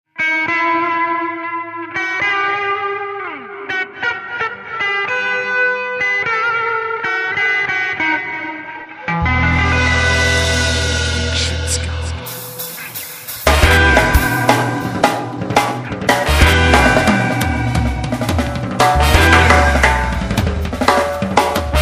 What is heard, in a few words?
Ska